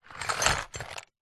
cardboard box small containing metal screws and other pieces short movement; zapsplat